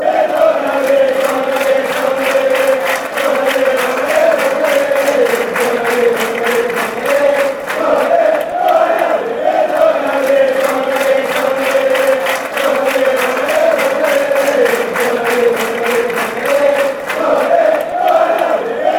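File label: fanchants, football chants; doria ale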